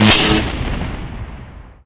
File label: shield hit; allegiance